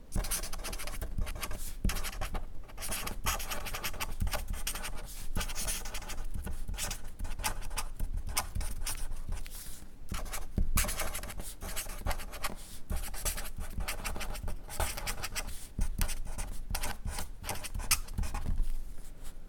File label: pen